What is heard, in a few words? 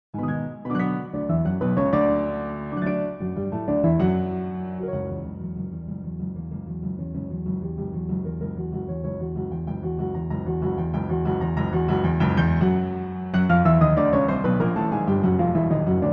Piano